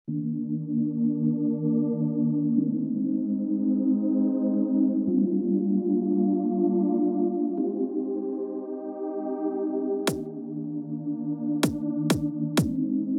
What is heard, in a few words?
hip hop; Novation Circuit Tracks